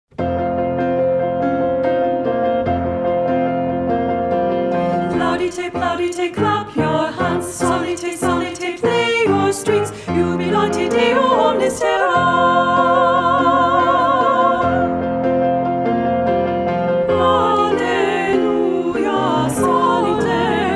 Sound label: A Choral Flourish SSA